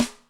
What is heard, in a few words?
Blue PIC, Acoustic Snare